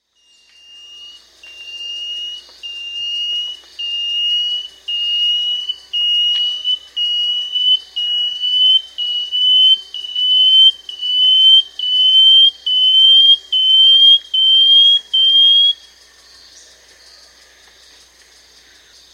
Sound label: Banded Antwren; S. America